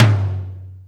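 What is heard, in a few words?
Tom Lo Power, Alesis D4 drum machine; 300 Drum Machines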